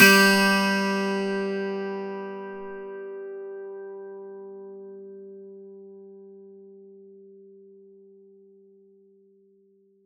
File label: PLK 12STR G3, Acoustic and Electric, Plucked; Polyend; Simulacrum Sounds; 1 Shots